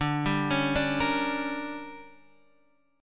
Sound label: D Major 7th Suspended 4th Sharp 5th, Guitar Standard; DM7sus4#5; arpeggiated; JGuitar